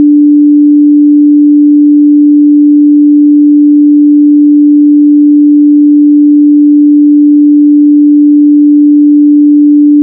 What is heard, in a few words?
Tone D